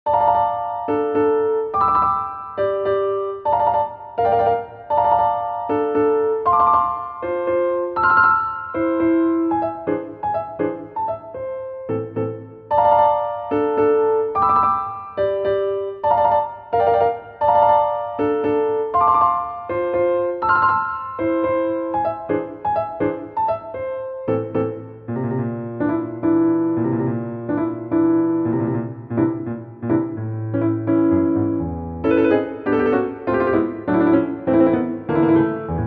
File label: piano; grieg